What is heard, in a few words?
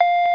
tone